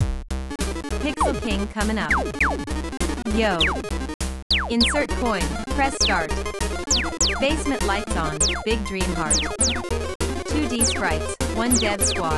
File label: rap mix